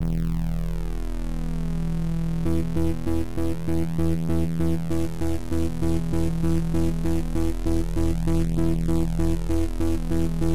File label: boss long minor key; Fronter